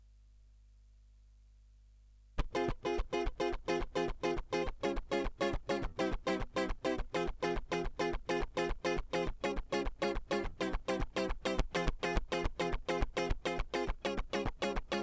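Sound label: Guitar Line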